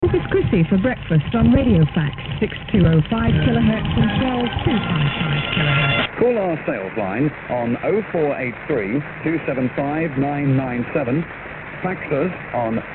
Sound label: Radiofax 12255 kHz